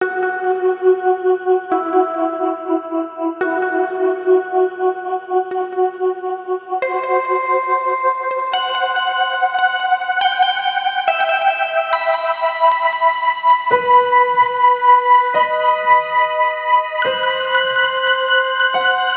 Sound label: keys one shot 2 pressure 135